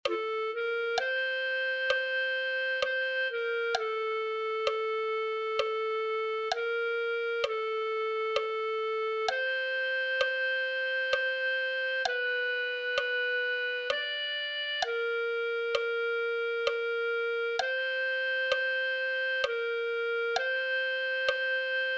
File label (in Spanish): clarinete